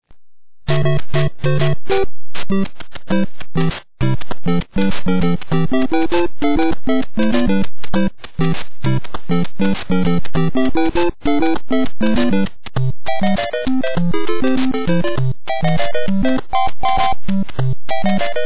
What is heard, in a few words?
8 bit; super mario